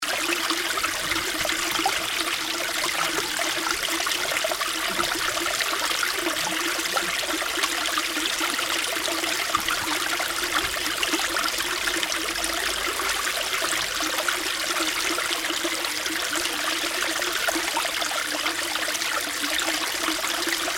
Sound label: water flow